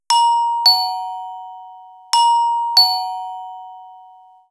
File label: Glockenspiel Glockenspiel, Deurbellen; 500 serie